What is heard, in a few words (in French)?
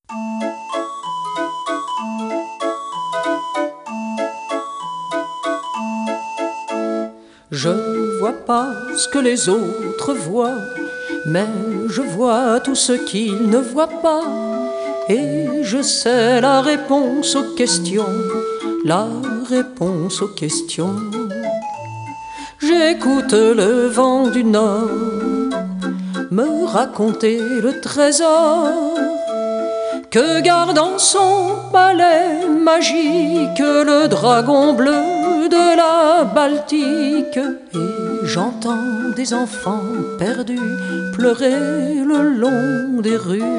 Orgue de Barbarie